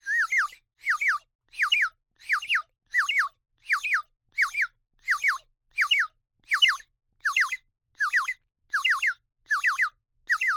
Bird whistle, clay, painted, water, airy, wispy, burst, sequence, doubles, triples, bouncing, varying; Eiravaein Works; Vaeyan IV